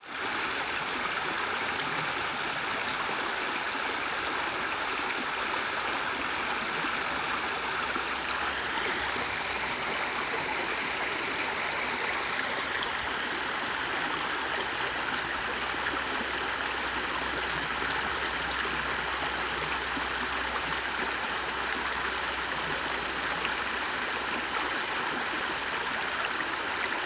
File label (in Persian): آب روان; Water sound